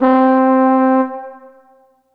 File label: Ensoniq SQ-1 French Horn C4